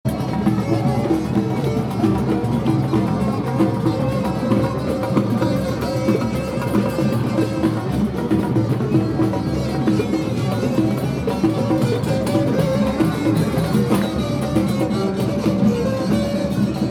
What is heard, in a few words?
Marrakech Jamaa El Fna Musicians; arabic, morocco, music, street, traditional